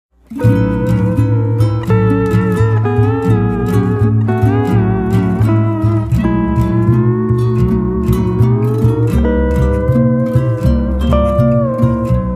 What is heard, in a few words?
soft